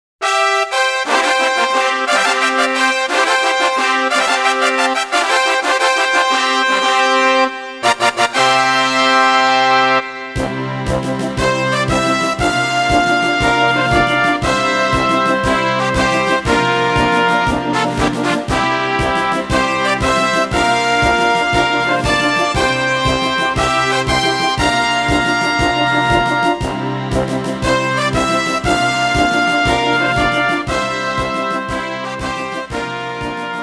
Victorious Fanfare; arc the lad sound track complete

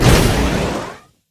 Drift Boost; multiplayer kart racing; boom karts; android

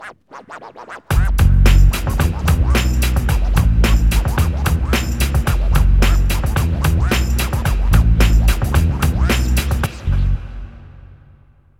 Ditty

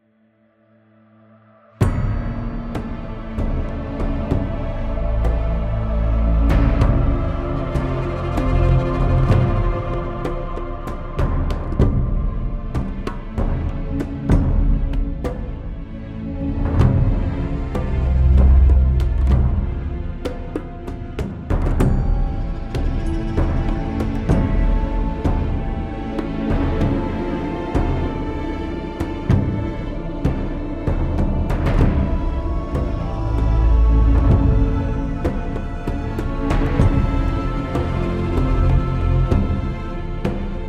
Loop Suspense

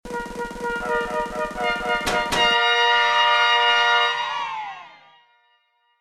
Game Winner; toasted; switch windows gamerip 2022

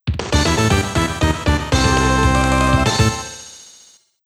Round Clear; final fight original sound collection

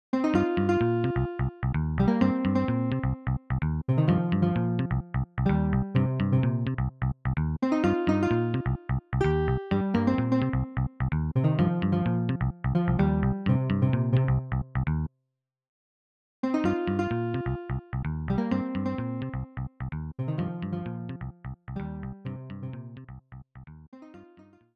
Roland SC-55; SimCity 2000

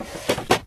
open; misao

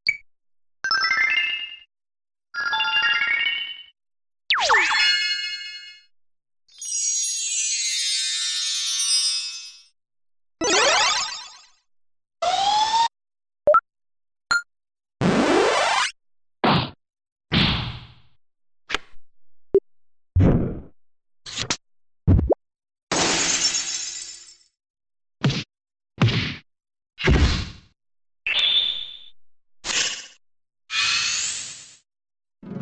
Sound Effects; Puzzle Bobble 3; puzzle bobble variety

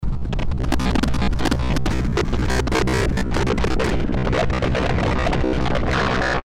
scan loop; Tron Legacy

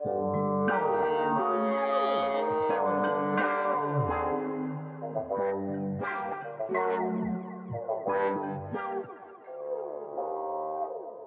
Cinematic Music; Space Cadet

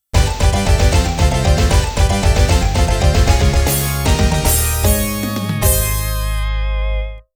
Round Clear Music

